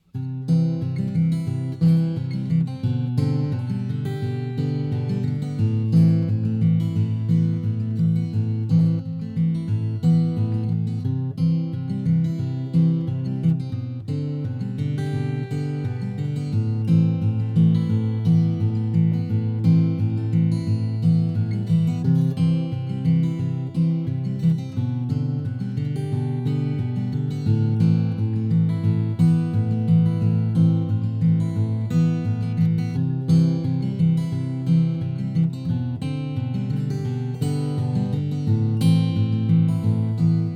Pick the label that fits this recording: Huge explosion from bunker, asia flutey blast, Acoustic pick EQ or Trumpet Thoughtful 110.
Acoustic pick EQ